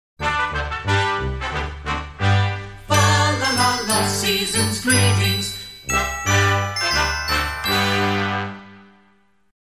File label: Deck The Halls, Brass Band and Small Group